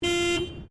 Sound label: CAR TOYOTA COROLLA HORN LONG EXTERIOR, hatchback; 2009 Toyota Corolla hatchback; Cars (Sound Effects:Vehicles)